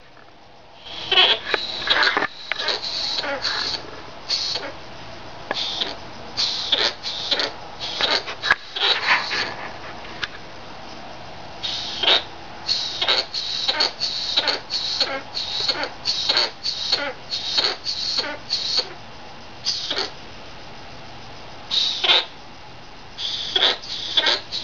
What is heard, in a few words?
grunting, sugar glider; Painful Grunting